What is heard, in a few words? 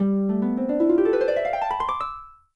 harp gliss; dreamcast; Dragon Riders; Chronicles of Pern